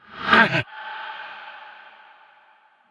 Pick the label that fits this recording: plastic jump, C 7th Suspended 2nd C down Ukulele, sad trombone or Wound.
Wound